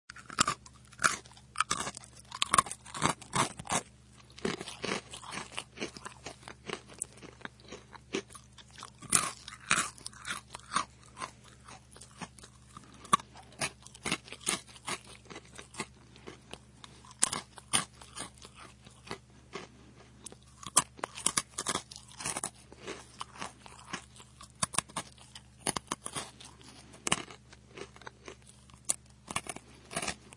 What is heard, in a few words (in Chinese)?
吃饼干嘎嘣脆; 搞笑音效